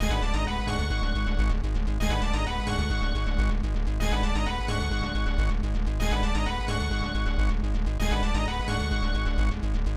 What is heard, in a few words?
Subway Finish